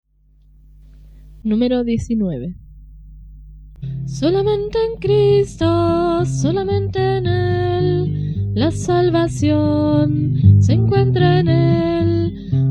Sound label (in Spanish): Coro, himnario niños